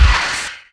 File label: jump; Alien Arena; droideka